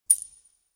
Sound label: UI moving option to one anoter